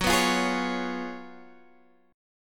Gb Major 7th Suspended 2nd Suspended 4th, Guitar Half Step Down